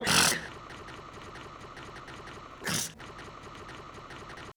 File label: snooze